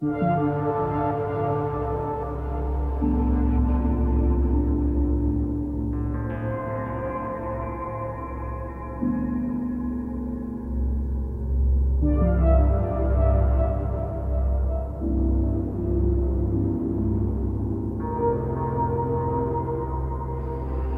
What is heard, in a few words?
Hideout Inside Ambient; Hello Neighbor 2